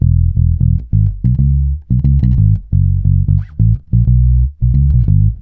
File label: E, dub, bass; Spectrasonics; Bass Legends CD 1; JOY DUB